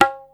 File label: tabla nah, Yamaha Motif ES drum machine; 300 Drum Machines; Yamaha Motif ES-Series